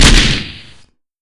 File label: stereo, weapons